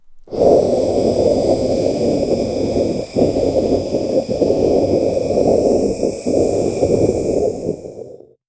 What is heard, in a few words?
smoke and poof soun